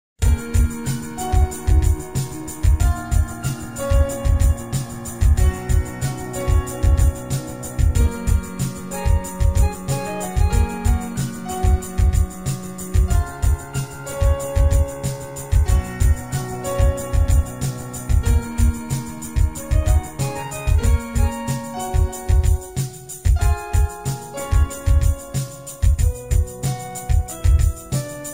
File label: Motorola Original Ringtone; Onyx